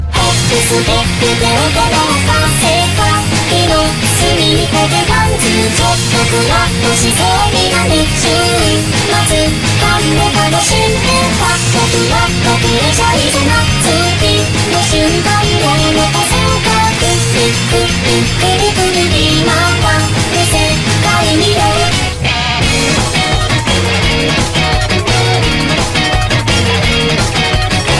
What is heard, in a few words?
Dancehall